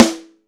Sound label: Tama; Best Service; Real Mega Drums VOL-1; WHGM KIT 4